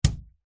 leisure hacky sack footbag hit glass window

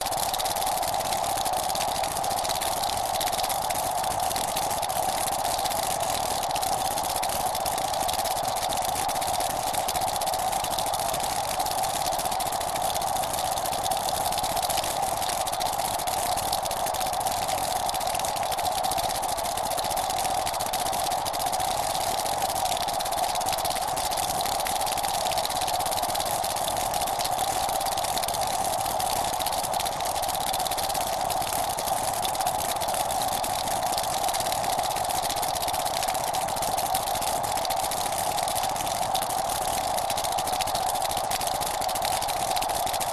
strong wind flapping loop

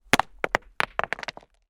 impact rock large rockpool dry

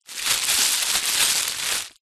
foley bag plastic small very thin movement

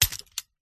office stapler mechanism click

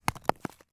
impact stone large hit ground

